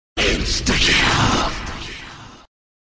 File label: insta kill sound effect; call of duty world at war nazi zombies